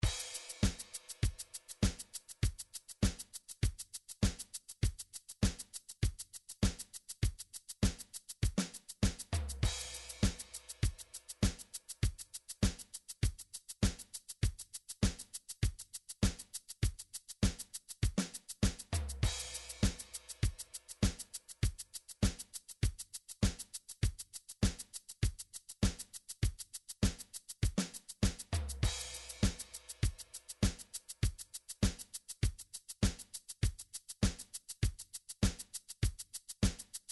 midi drums, disco

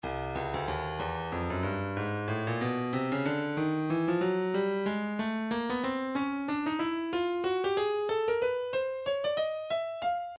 breakbeat 093 bpm; hipster a; Stylus Breakbeat Midi Files

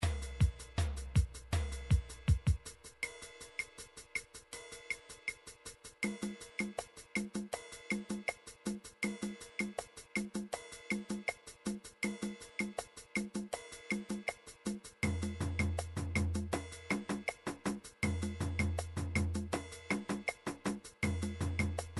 drums

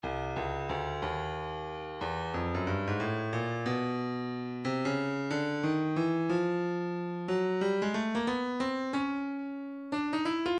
stylus breakbeat midi 091 bpm; Urbadub

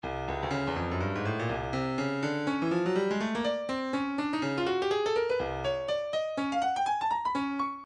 stylus breakbeat midi 123 bpm jive house

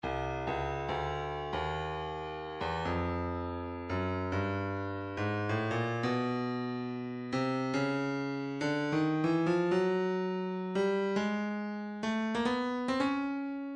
breakbeat 070 bpm; Stylus Breakbeat Midi Files; urban tight e

